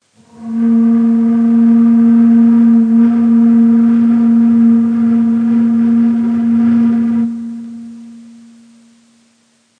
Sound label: Pes Shuri, Ney